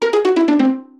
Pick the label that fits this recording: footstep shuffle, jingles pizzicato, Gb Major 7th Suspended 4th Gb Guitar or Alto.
jingles pizzicato